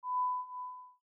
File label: pings